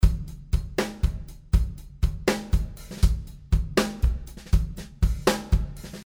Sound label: DRUMS Dry